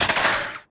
damage vehicle; World Of Warships

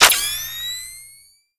night vision on

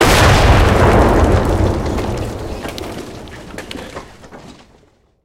building hit; tanks; War Thunder

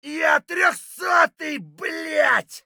hurt heavy; Escape from Tarkov

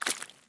jump puddle; Escape from Tarkov

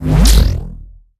torpedo; World Of Warships

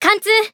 Hit Confirmation; Kaguya; World Of Warships; Lunar Thanksgiving Day

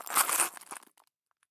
stop glass; Escape from Tarkov

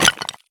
sprint tile; Escape from Tarkov

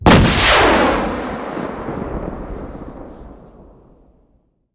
gun tow; guns; World of Tanks Blitz

